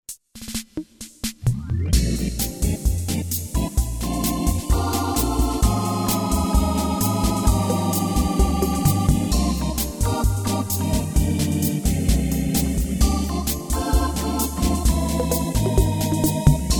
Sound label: Hammond Preset Demos